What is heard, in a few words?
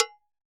Campana Mute; Best Service; Festa Latina VOL-1; Single-Hits